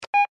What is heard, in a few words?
Technology Telephone Speaker Phone Press Button Beep